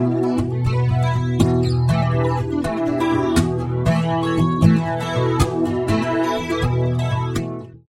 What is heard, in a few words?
warm chorus guitar wet